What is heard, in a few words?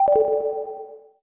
error; Business skieme Beta 1